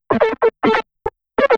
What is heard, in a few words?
TECHNO SYNTH 140; Keys